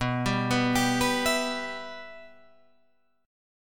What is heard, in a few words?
B Suspended 4th Sharp 5th B Guitar Drop D